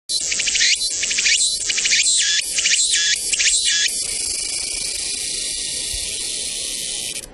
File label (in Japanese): tsukutsukuboushi, cry of insects